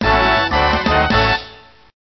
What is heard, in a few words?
Big Band